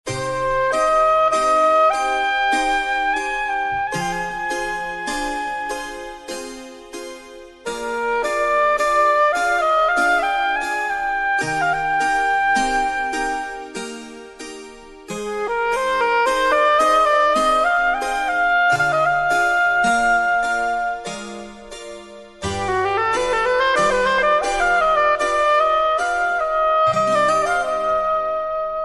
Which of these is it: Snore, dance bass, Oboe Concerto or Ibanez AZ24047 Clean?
Oboe Concerto